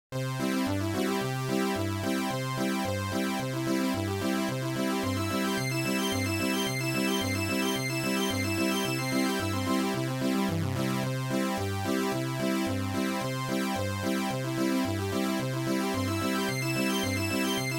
8bit Dance; maestro vol. 2

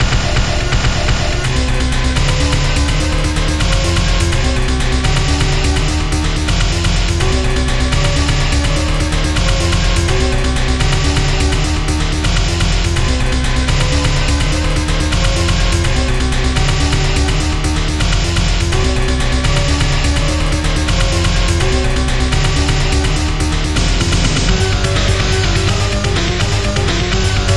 Final Tank Level Boss Battle

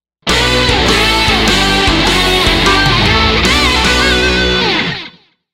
Game Over; Flying Girl Striker

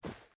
land generic

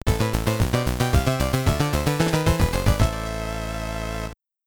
Boss Clear; Akumajou Densetsu

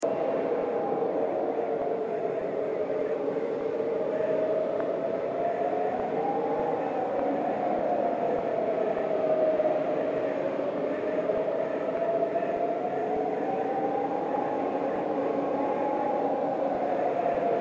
canyon Wind; amb; Tatooine; star wars battlefront

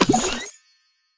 Btl Actlrng Boxopen; The Denpa Men; Android

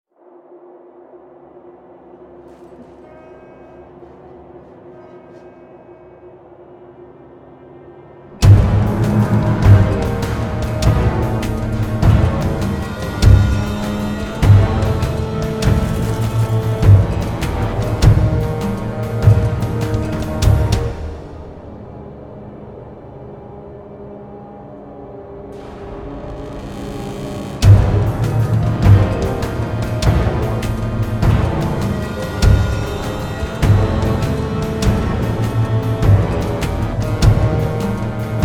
music Distillery Combat

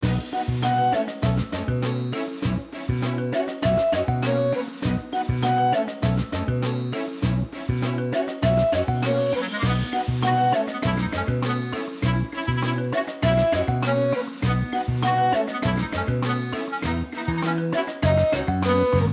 menu music; Backyard Heroes; android game music

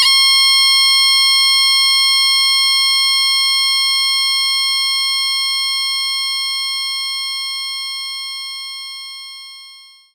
Bagpipe Chant; knights try

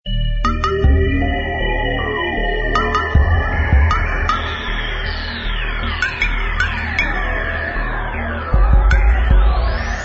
Music Theme

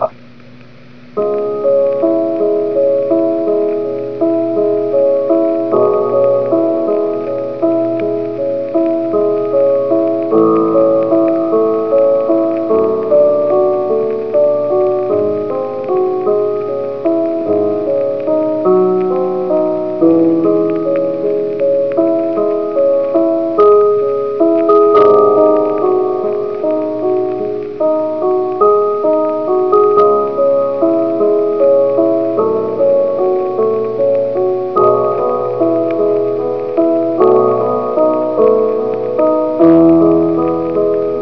Piano Sonata No. 14 In C-Sharp Minor; Return To Castle Wolfenstein; Op. 27 No. 2 “moonlight Sonata” I. Adagio Sostenuto

